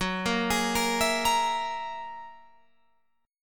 Gb 9th Gb down Guitar Open F; Gb9 arpeggiated

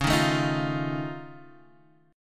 F 7th C sharp; strummed; chord; JGuitar

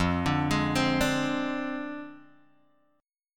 F Minor 6th Guitar Open D; 3,5,6,6,5,x; down; chord shape

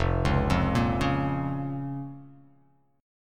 F Suspended 4th Sharp 5th F; arpeggiated; JGuitar

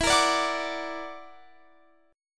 F sharp Augmented 7th E, chord

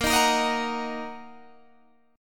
Bb Suspended 4th Sharp 5th Bb

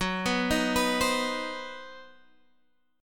C Minor Major 7th Flat 5th F sharp, chord

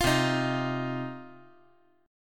C Minor Double Flat 5th C down 5G 4C 5E 5A

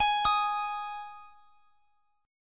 G sharp 5th G sharp

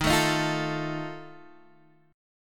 Eb Suspended 2nd Flat 5th, Guitar, Half Step Down

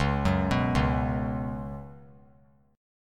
Db 7th Suspended 4th Db down; JGuitar

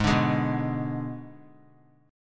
G sharp Diminished G sharp; G#dim strummed; JGuitar

